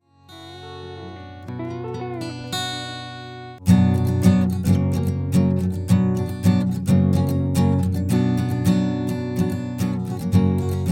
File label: Instrumental